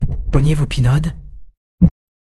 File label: jingle